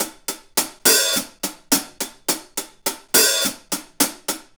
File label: Hi Hat Pattern; Essential Percussion; Hi Hat Patterns